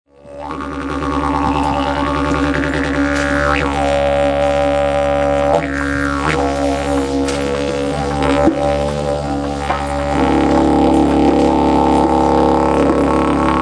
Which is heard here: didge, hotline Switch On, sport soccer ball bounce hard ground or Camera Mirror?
didge